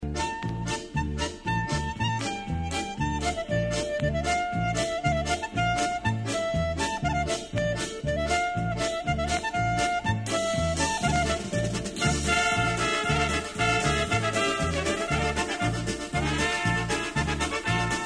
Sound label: 5-Polka Medley